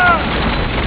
laser gun

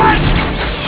laser gun

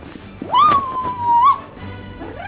female laugh

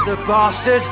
sample, male laugh

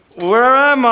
sample, male laugh

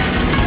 close door

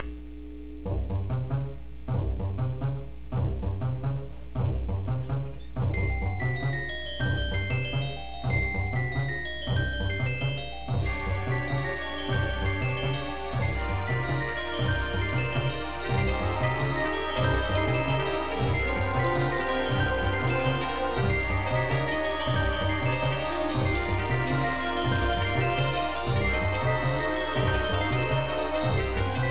scary

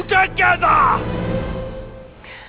female laugh

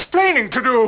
laser gun

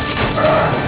laser gun

